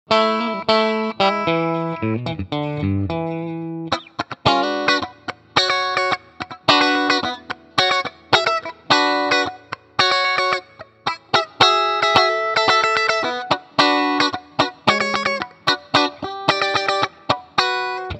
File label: Joyo Preamp House US 65 TW